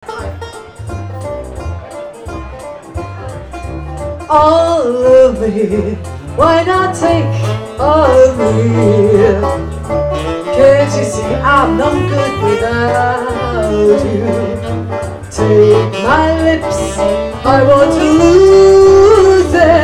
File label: Vocal a bit of sax